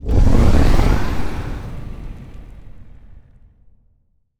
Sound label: necroid moving out colony ship; Stellaris; necroids